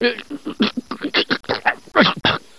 scientist drowning

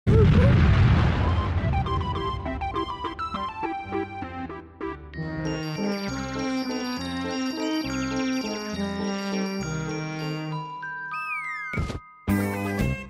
gets knocked out by explosion

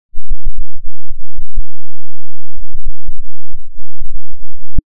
bomb

